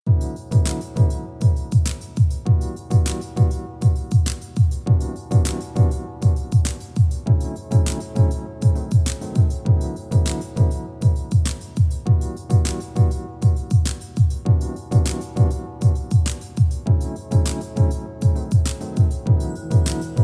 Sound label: 432 hz chillout music; music in action